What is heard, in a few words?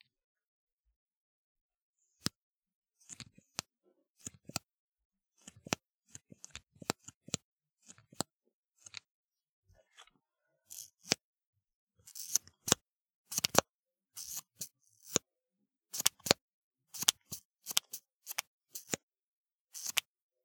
Mascara